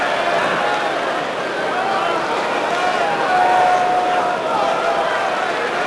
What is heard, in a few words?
Medium Crowd Idle; David Beckham Soccer; XBOX